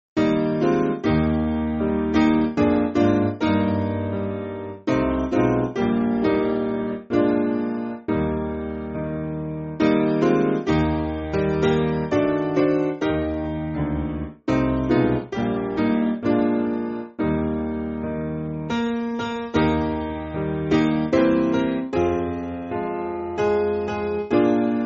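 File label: Piano, church backing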